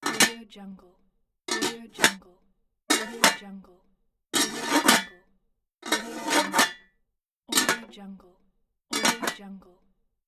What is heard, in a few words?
aluminum pan clinking